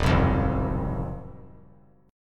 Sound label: Eb Minor 6th Add 9th Eb down 3C,3F,3A,3D; strummed